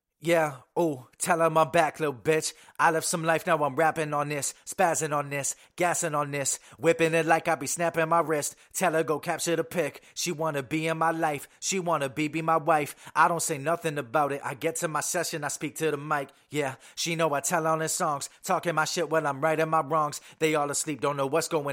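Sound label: hip hop 123 C sharp minor, Acapella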